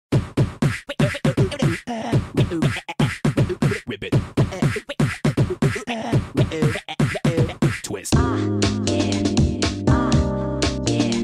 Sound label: Fresh Instrumental; Friday Night Funkin'